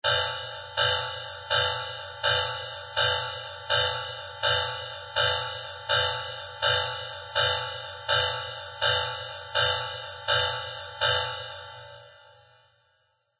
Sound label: alarm